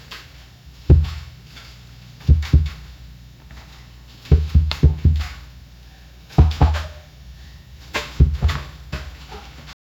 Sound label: quick dusty paw thuds; attic